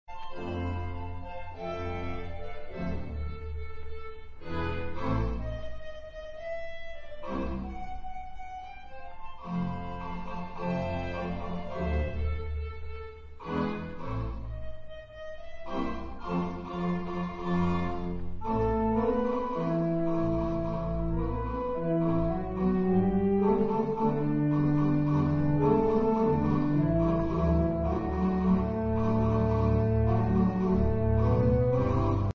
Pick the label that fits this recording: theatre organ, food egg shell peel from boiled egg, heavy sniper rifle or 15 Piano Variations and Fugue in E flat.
theatre organ